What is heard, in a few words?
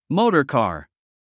motorcar